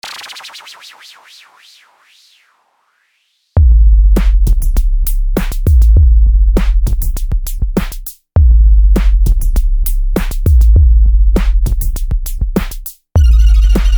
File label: bass